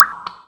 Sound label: drop, underground; ambient